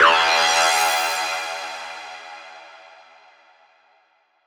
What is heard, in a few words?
Fx 130, Techno; Techno Sounds 2024